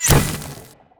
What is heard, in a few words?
warp; garrysmod